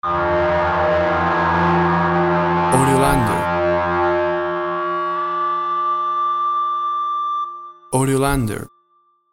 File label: Witch Scream Low, The sound of a witch screaming; The sound of a witch screaming (Low) which is great as a short stinger piece of audio